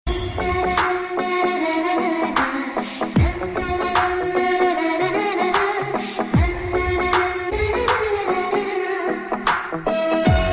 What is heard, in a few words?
Sms Music